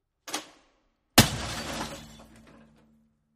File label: Television Smash Drop; power on off